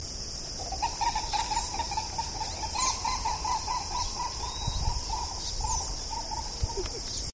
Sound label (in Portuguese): coro-coro, aves